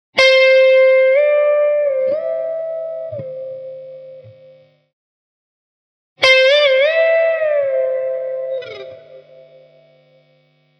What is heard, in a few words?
2tone bend, guitar